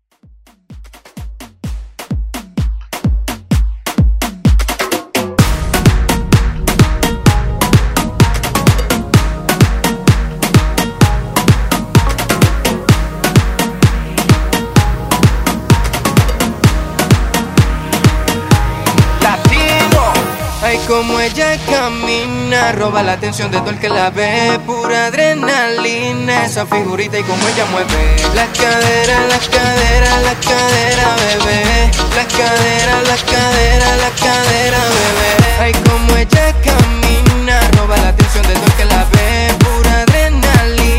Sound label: Afro Redrum